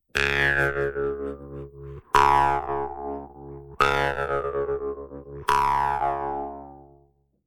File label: doromb choir